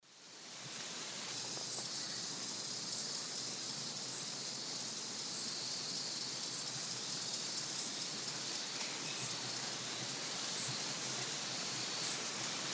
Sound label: Heliodoxa aurescens, call male; S. America; Eastern Lowlands